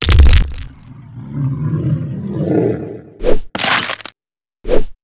MONSTER HEAD

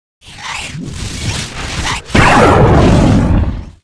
DEAD EGG

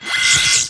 attack

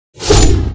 ATTACK

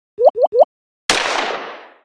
emoticon

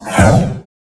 attack jump

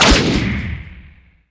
ATTACK

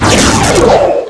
ATTACK

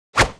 attack

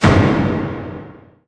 PVP close

